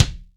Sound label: KICK, MG KICK